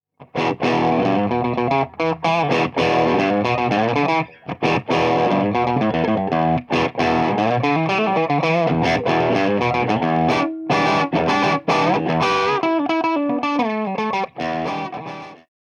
Retro 55 LP Junior; Munt

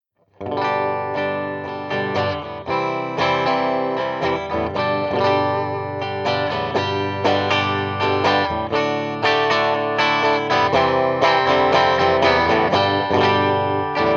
Samples Gretsch Clean Crunch; Sir Charles

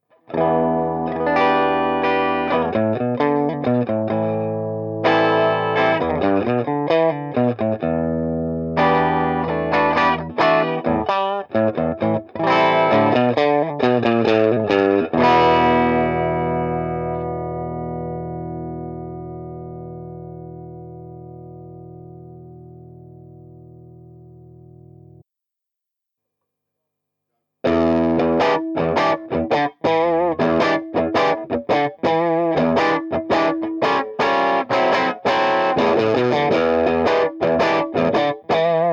Alnico Tele

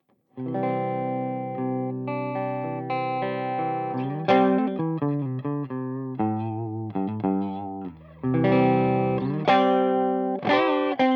G12H Strat

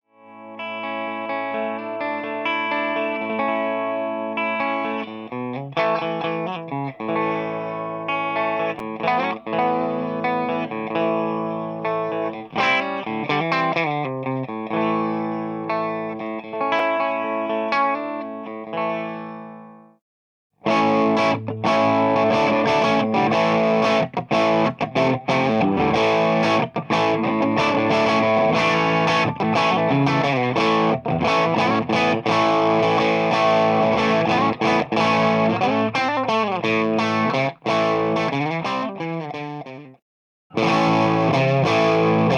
Retro Tele

